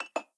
Serving; blue archive

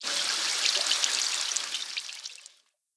Hose Water; blue archive

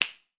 Flick; blue archive

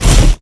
Drone Vulcan Attack End; blue archive

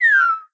Emoticon Motion Tear; blue archive

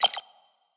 UI Bleep Check Confirm Mid; blue archive

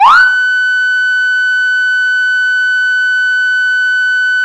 manual, sirens; federal signal pa 640

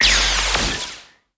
Flash Sound; blue archive

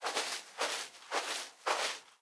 Sand Foot Step; blue archive

